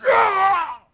Player Pain Grunt

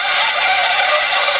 Cable Jib Loop; XBOX; Amped 2

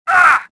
choke, worker; worker3

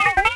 vox, droids robots

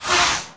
bus stop; Tony Hawk's Project 8; XBOX; shops

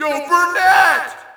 Shout, audience; UFC Tapout 2

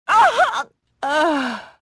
death; mothma

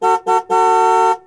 horn

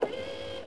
walk

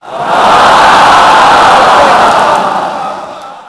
big aah; WhiteOut; XBOX; trojan

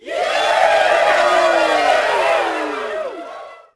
CROWD POS HIGH; Cars; XBOX

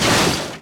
crush, Impact

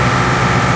jeep run; Soldier Of Fortune II Double Helix; XBOX; ambience

